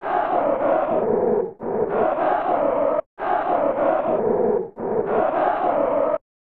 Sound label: Crowd Chanting; snes; Kick Off 3